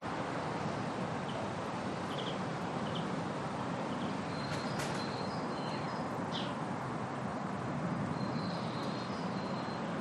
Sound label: RESIDENTIAL Afternoon Birds Distant Traffic Distant Voices Human Activities; Lawnmover